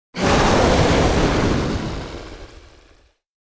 Large beast monster ferocious roar

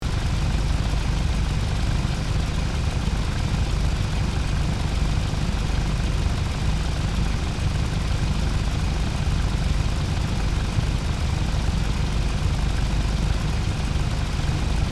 Sports car engine driving loopable

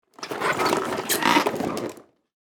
Squeaky wooden door handle movement

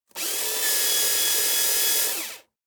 Casino slot machine ticket eject

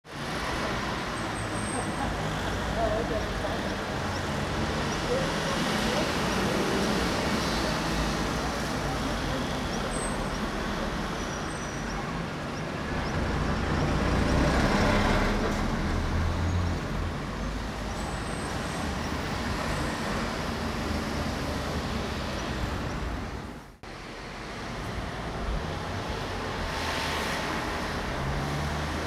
City street ambience traffic and cars passing by

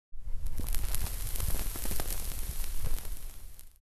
Cigarette lighting and burning close perspective

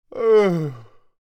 Deep big male yawn

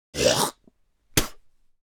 Human mouth gargling and spit

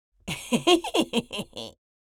Elderly woman giggle; laugh

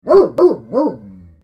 Large dog barking aggressive

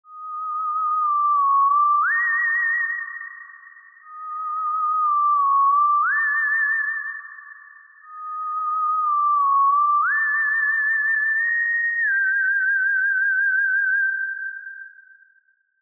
Spooky halloween theremin

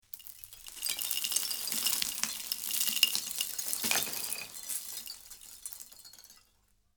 Slowly pouring tiny debris on a rock pile; rocks

